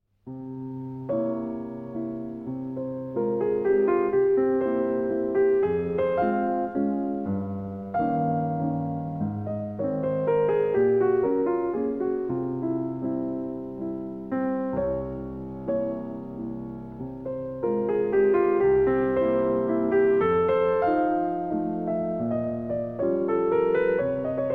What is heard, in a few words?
mazurka